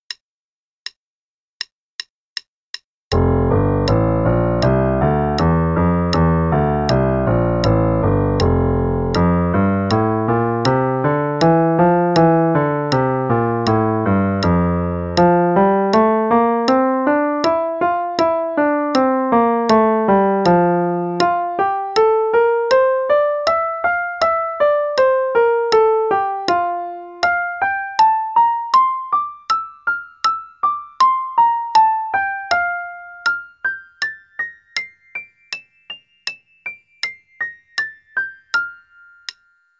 F Scale Warm-up, qn=160; Level 2B - 2nd Ed

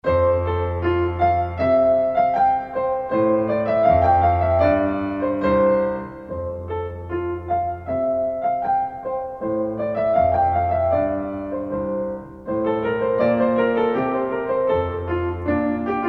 Gavotte; Speer

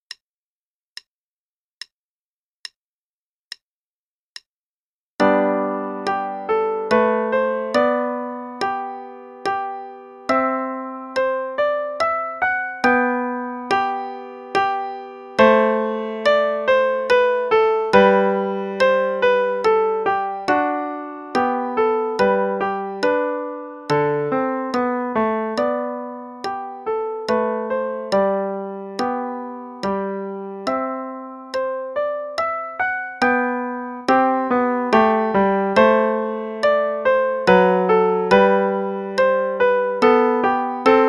Minuet in G Major (1st page only, qn=72); Bach; Bach Notebook

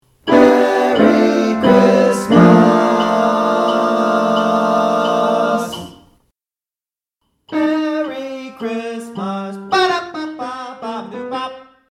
All parts sung; christmas; Concert Opening 1 and 2

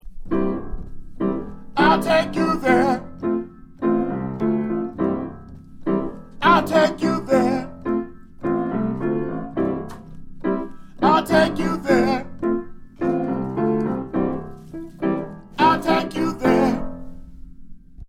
Tenor

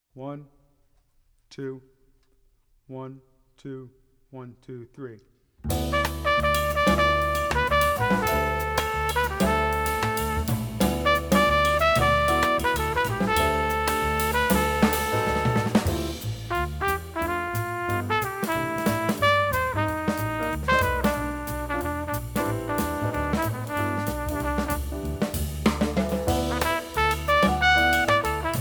Night Eyes (With Alto); jazz; intermediate jazz conception

